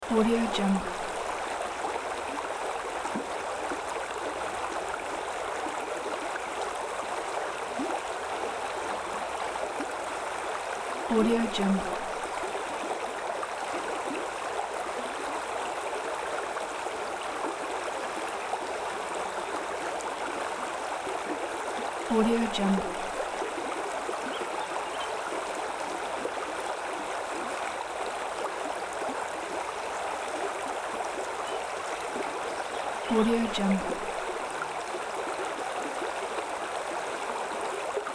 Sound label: river wide stream perspective; رودخانه در فاصله نزدی; nature sounds